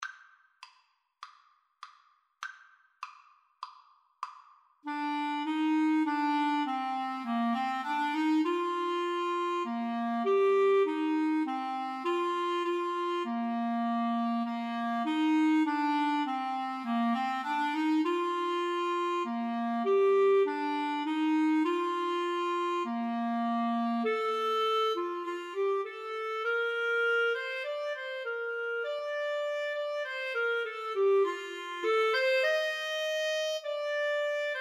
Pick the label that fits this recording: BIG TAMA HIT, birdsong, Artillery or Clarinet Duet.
Clarinet Duet